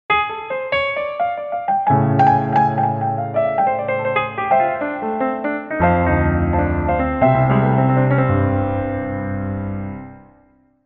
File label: jazzy